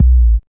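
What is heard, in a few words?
bass drum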